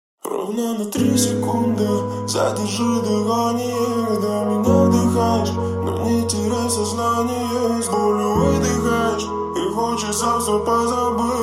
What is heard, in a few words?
Groove